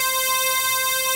FATTY SYN, DANCE; Pro-Rec - Synthsonic; Z1 DANCE

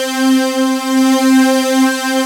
PAD HOT, Synth Pads; SYN-PADs; Synth-Pads 4

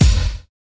TRIP KICK; Drums In Your FACE!; BONUSKIK

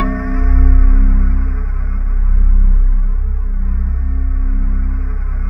C1, Synth Leads

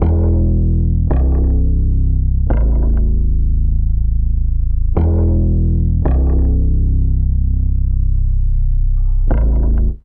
Snapper Bass; Zero-G Smoke

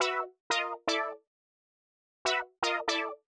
120 Stabs A, Keys, Nu Jazz House; PowerFX

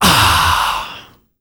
AAHHH, World Voices, Afro noise; ILIO; Vocal Planet VOL-5

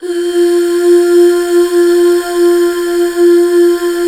UUHH, Back Uuh Fem

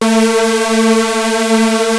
Prophet 5 A4, Synthesizer; Hollywood Edge - Giorgio Moroder Rare Synthesizer Collection

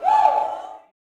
"WOO", Gospel, YELL SHOUT

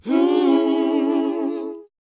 WHOA B, Vocal R&B & Dance; ILIO; Vocal Planet

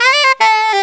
SAX LIC; AMG - Now CD-ROM (Roland)

